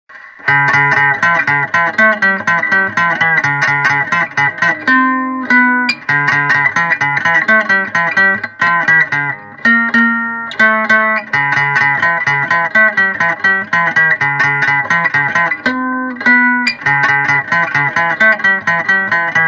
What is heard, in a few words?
Music